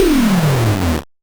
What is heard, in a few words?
Noise A, 8-bit, Bitty Noises